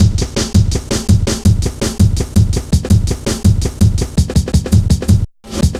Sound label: Hard Edged, Drum Loops, 165.5; Zero-G Jungle Warfare 1, 2 & 3